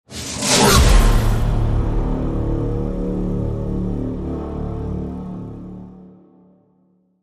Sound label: Hit Tonal Take Cover